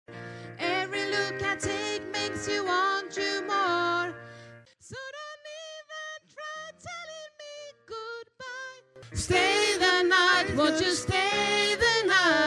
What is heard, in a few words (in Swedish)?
bas, stammor